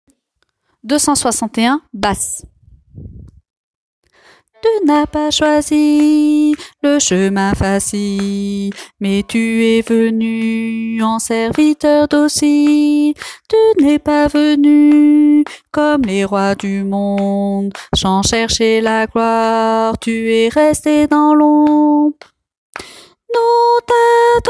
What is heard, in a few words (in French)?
basse